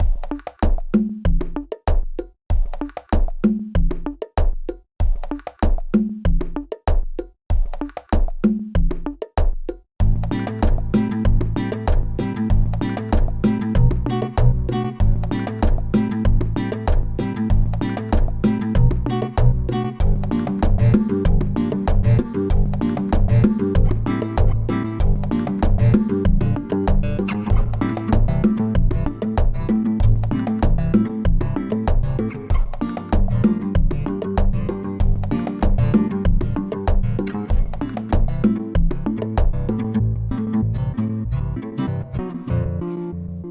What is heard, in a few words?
Warm Guitar Rhythm Glitched Intro